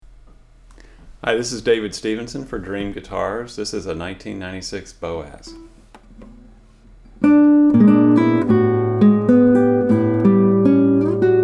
classical, nylon-string classical guitar; boaz

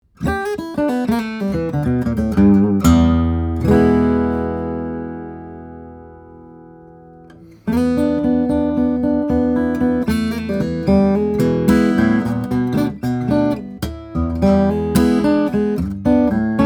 Jumbo, steel string guitars flattop; brazilian rosewood european spruce; Franklin

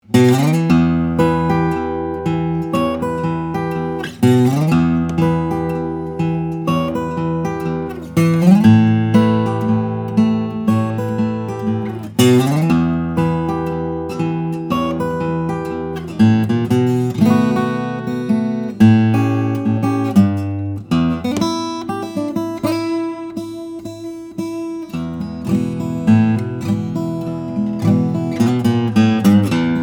OM, Brazilian Rosewood/Adirondack Spruce; Dudenbostel; flattop

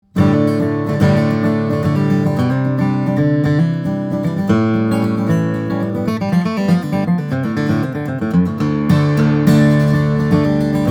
Froggy Bottom SJ, guitars steel string flattop; deluxe; mahogany; adirondack spruce

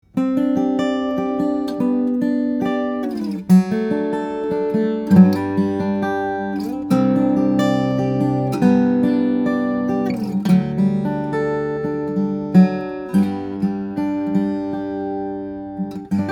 guitars, steel-string guitars, flattop; brazilian rosewood; italian spruce; Wingert

